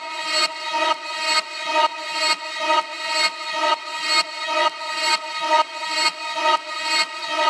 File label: House Loops, Vocal Loops House 128BPM